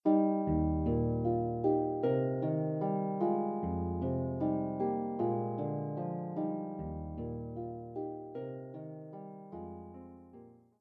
Welsh Traditional Tunes, Harp; Top Ten Welsh Traditional Tunes

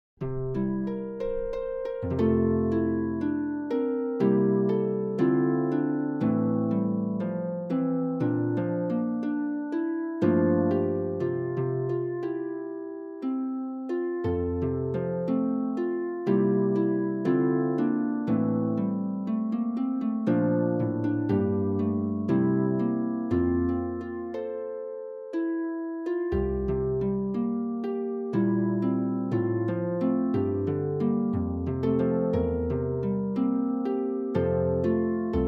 Popular Folk Songs for the Harp, Allan Water

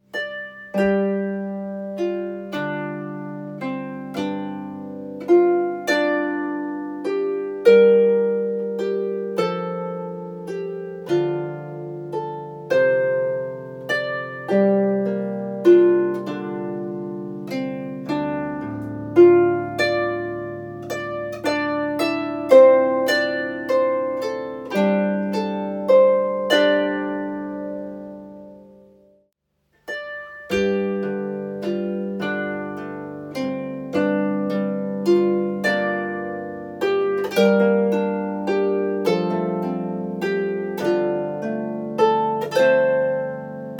Gaelic Airs, harp; 4 Gaelic Airs

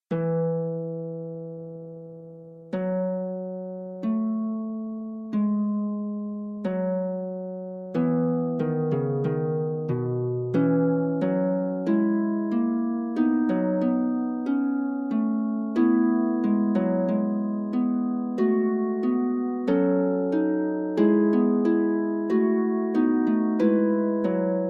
Fugue, harp; Forty Eight Preludes and Fugues Book 2; prelude and fugue no 9 in e major book 2

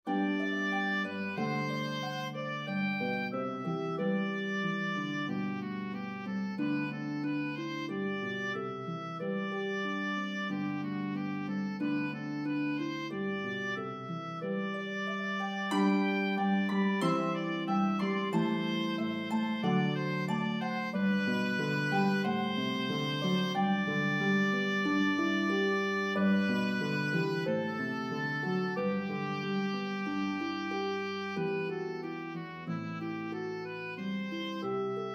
Harp Melody Instrument Oboe

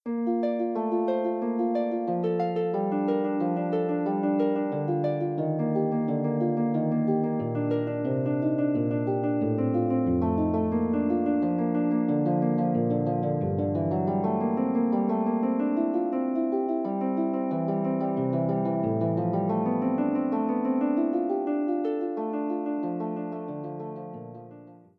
prelude, Harp

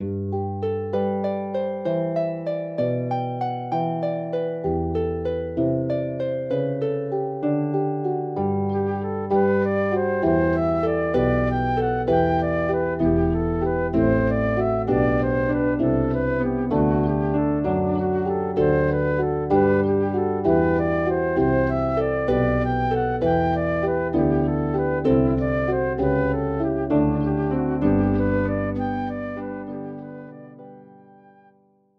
Flute and Harp; Wedding Music for Flute and Harp Vol 1; jesu joy of mans desiring

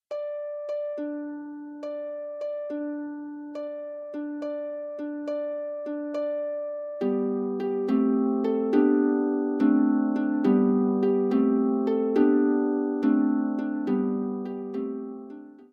Harp; Tudfuls Harp; Os gwelwch yn Dda Gai Crempog; Please May I Have a Pancake

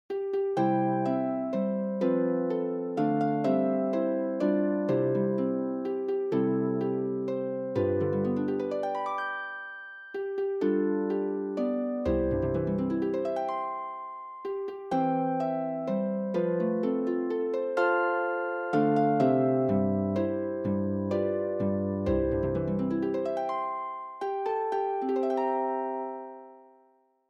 Happy Birthday C Solo, harp; Happy Birthday to You

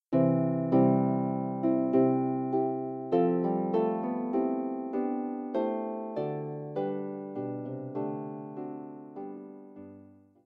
Harp

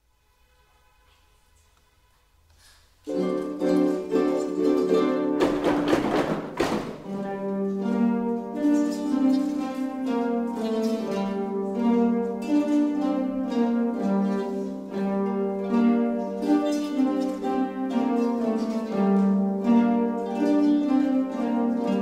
Harp; Harp at First Sight

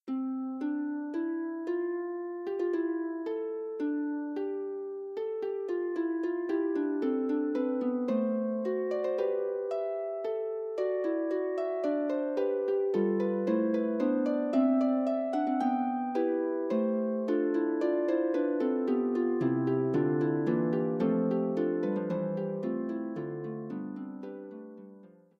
Fugue, Harp

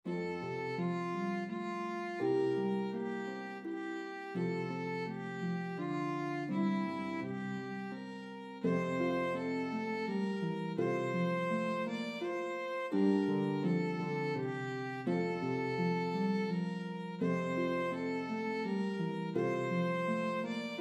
Harp and Melody Instrument Violin

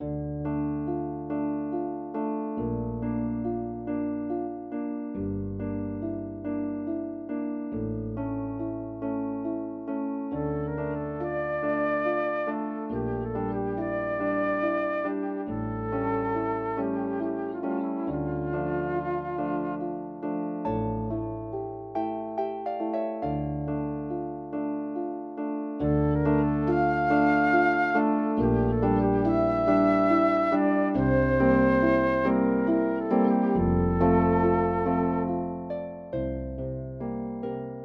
Serenades for Flute and Harp; serenade schubert

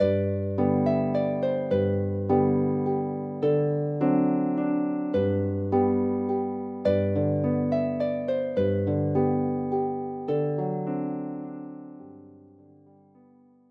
Harp; Famous Music for the Harp Vol 6

